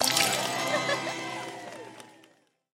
Звук покупки предмета за кристаллы

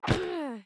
Звук удара карты о карту

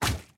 Звук удара карты об персонажа

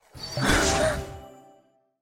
Звук комбинации карт

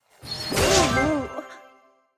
Звук получения новой комбо карты

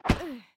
Звук удара карты о карту

